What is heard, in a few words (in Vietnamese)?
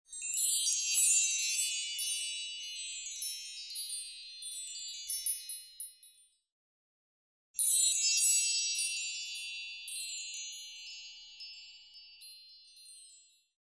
Am thanh tien giang tran